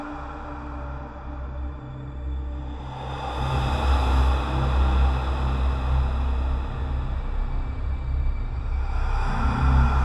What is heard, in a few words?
Horror Game Ambience Loop Ancient Curse Full Breath Airy Whoosh Wind Frozen Snow Day; Aron's Adventure